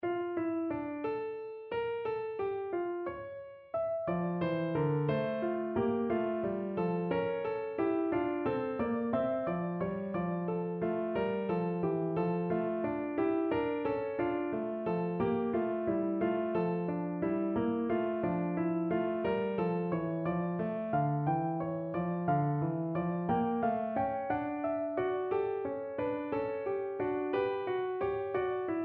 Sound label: Canon Example